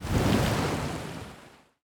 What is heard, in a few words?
submarines engines; World Of Warships